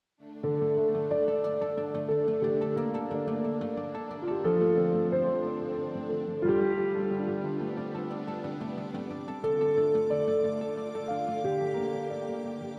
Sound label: Piano Harp